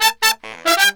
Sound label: MERENGUE, World Grooves